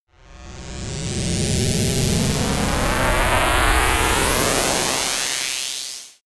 passing citadel

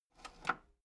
metal lever button radio disable slow

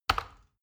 plastic button tiny click heavy fast

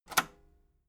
metal lever button radio disable fast

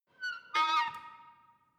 window screech reverberant

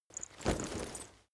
leather metal impact soft

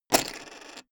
poker chip stack big fall down

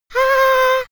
little girl laugh

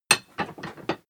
moving metal massive

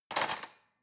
cutlery fork drawer handle

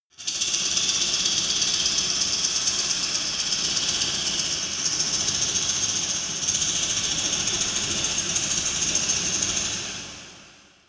dark resonator rattle fast hard

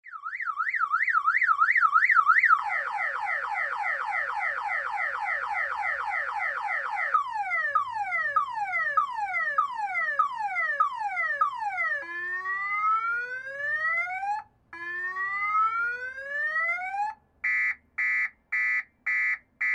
car alarm from outside

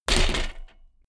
bmx bike land impact rattle

